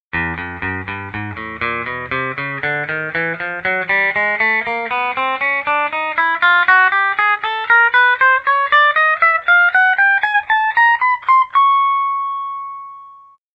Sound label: Stratocaster